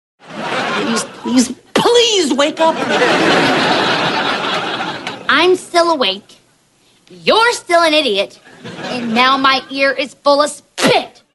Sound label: spit